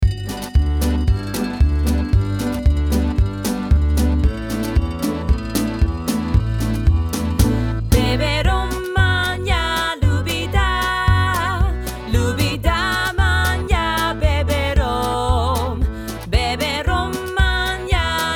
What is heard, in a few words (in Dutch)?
tweestemmig